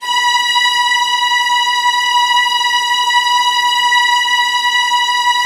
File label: VIOLINS C#, Synclavier Strings; ILIO